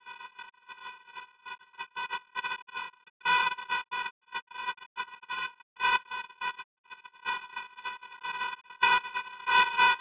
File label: Jazz